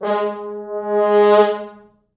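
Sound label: French horns, four, g#2; East West; Quantum Leap Horns Sax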